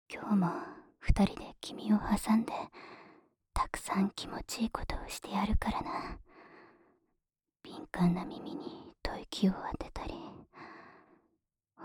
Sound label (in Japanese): 耳舐めコース; あなた専用耳舐めコース、開幕